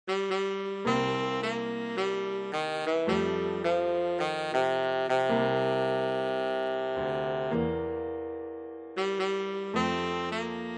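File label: tenor saxophone; Klavierbegleitung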